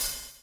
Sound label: BUBL, Backbeat; Spectrasonics - Backbeat